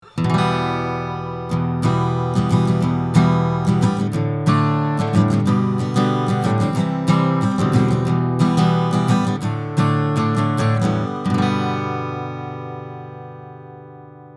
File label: Neumann U67 Guitar Strumming Cardioid 20cm